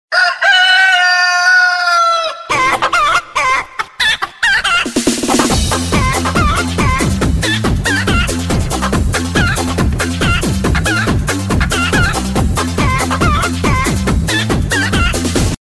rooster

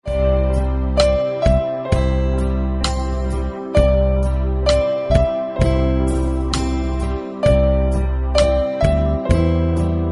piano

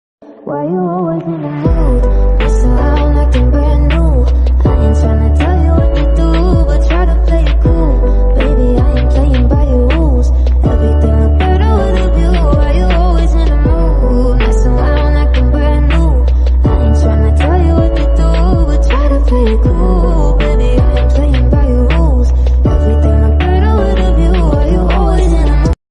Lofi

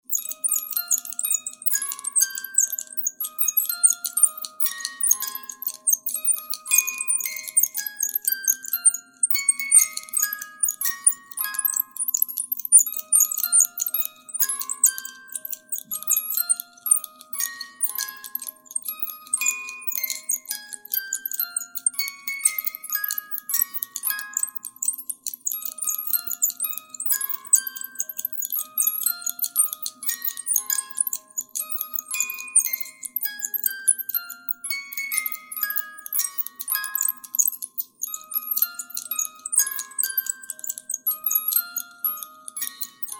happy birthday song music box

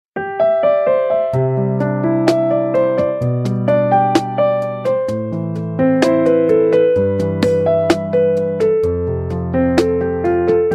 Peaceful Morning Relaxing Instrumental

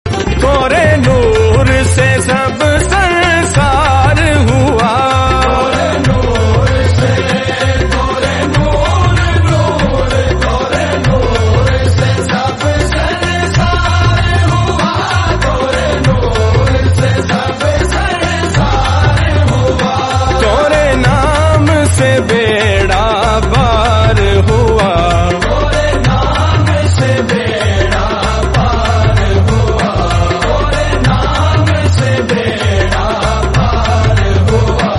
Islamic Naat; Heart Touching

